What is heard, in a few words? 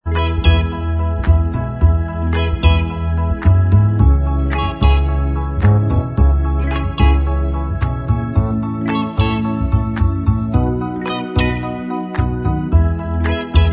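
instrumental, backing tracks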